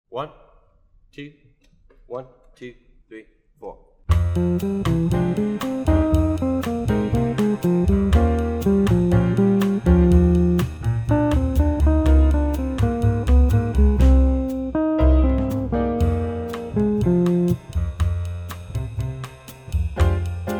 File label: latin guitar and vibes